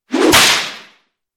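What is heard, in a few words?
Whip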